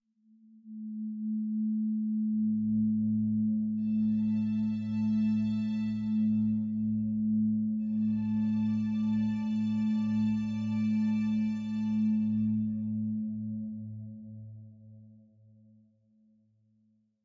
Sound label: ambiant; Quivr; PS4 Windows gamerip 2016